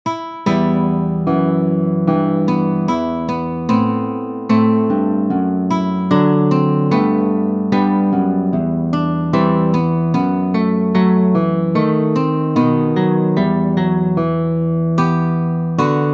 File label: Sonata Allemande andante, guitar; Weiss